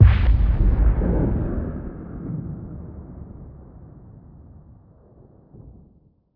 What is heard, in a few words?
dragon death explosion